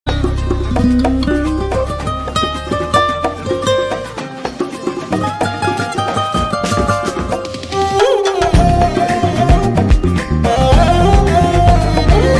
violin bgm; agnathavasi

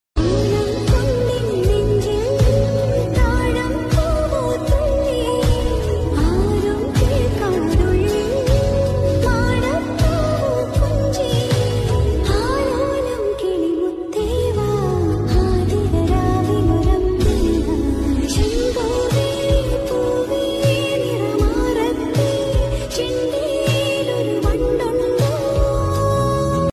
Malayalam

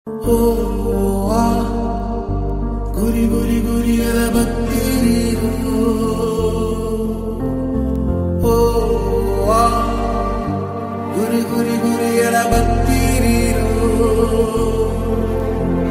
Emotional Bgm; rrr; bheem and tiger

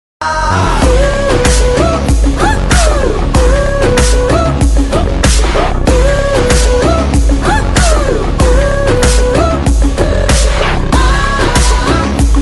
bgm ringtone; Vettaiyan; prevue; Rajinikanth

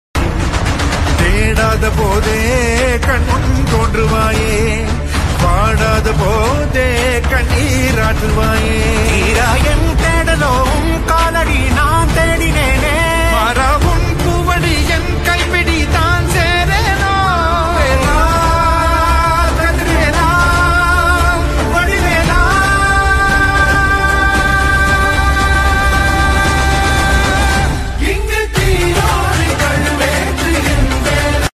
song bgm ringtone; aranmanai 3

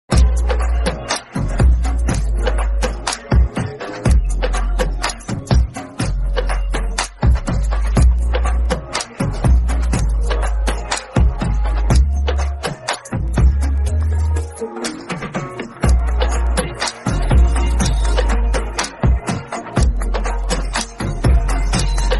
song bgm ringtone, telugu bgm ringtones; viswaksen; kaira adwani

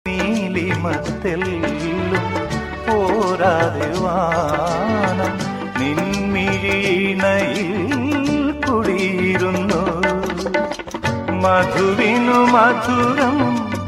song, malayalam melody songs

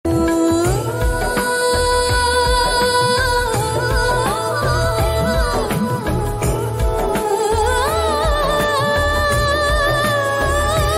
melting voice bgm

